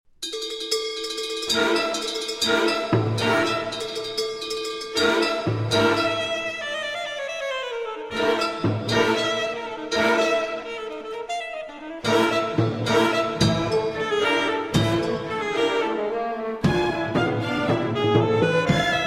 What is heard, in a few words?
Double Concerto For Alto Saxophone, Percussion and Orchestra